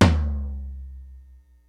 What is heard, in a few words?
LIVE AC KIT, DRUMS; Kid Nepro; Elite